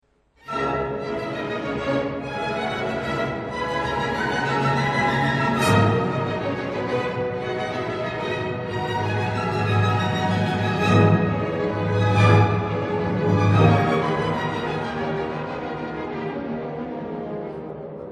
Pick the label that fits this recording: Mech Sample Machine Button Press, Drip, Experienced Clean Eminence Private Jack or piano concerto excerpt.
piano concerto excerpt